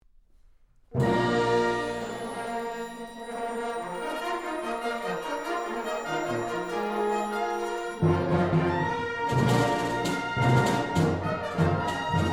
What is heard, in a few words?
wind ensemble plays star wars; swarthmore